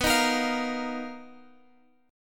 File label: B Major 7th Flat 5th B Guitar Open F; strummed